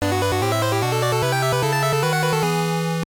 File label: Jingle, soundtrack; Zoids Densetsu; gb